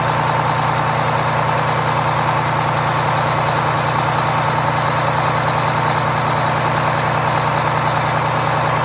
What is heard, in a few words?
apc idle loop; vehicles